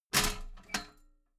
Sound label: metal ladder small handle unfold regular